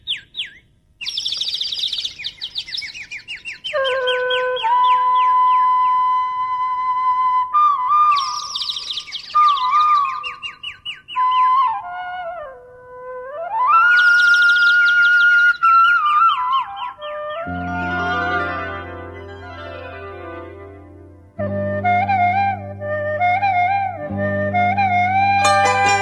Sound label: flute